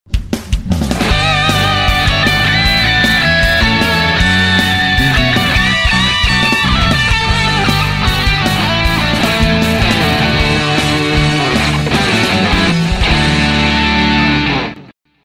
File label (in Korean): gitarsolo